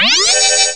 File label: squeeck; ST-XX Amiga Soundtracker Sample Packs